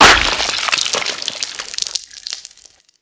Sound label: chop, Gore